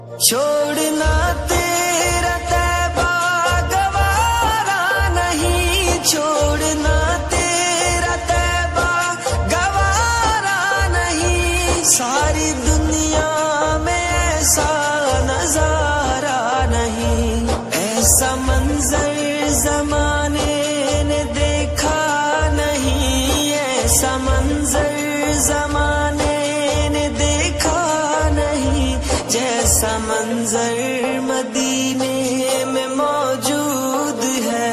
Naat Ringtone

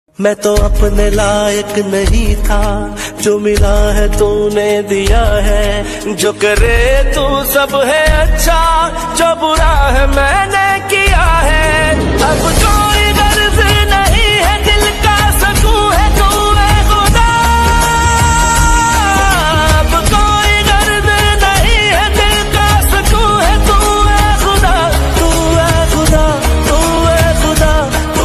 Islamic Ringtone

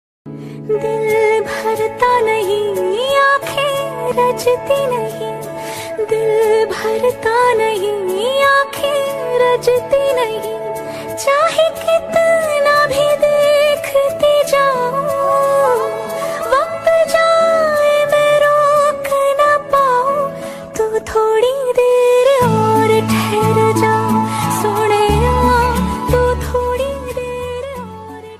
Romantic Hindi Song Ringtone; ringtones

